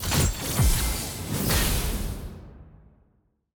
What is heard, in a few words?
sfx brackets checkmark; clash